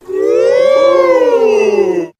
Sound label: "woo" crowd goading, voice; ooh ahh; ho hoo woo